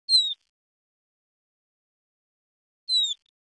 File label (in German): Nyctalus noctula Hallo Ruf hoerbar 4x verlangsamt; Fledermaussprache